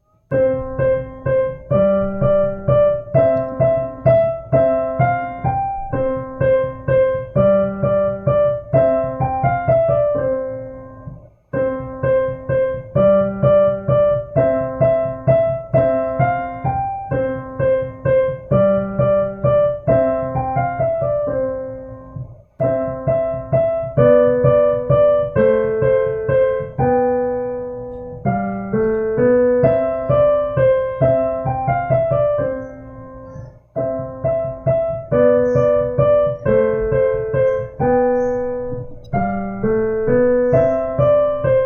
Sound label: english menuet; Reinagle, Alexander